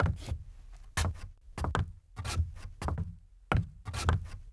preslapy drevo; Mafie 1

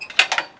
pumpa; Mafie 1

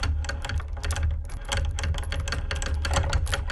můstek; Mafie 1

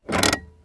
rucka; Mafie 1

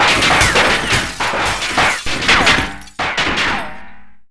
kulky; Mafie 1

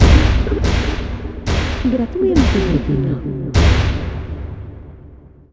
záblesky; Mafie 1

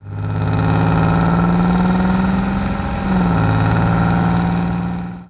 auto; Mafie 1

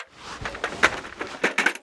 naradi; Mafie 1

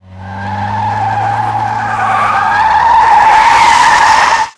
pisteni pneu; Mafie 1

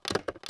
gramec; Mafie 1

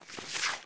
pohození fotek; Mafie 1

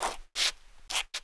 cigo zaslap; Mafie 1

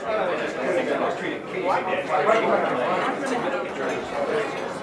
ambi bar; Mafie 1

